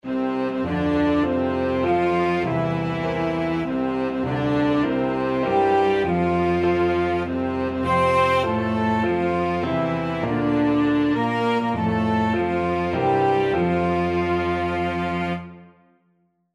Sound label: happy birthday, flexible unison